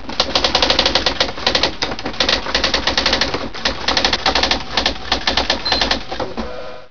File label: Typewriter; iLinux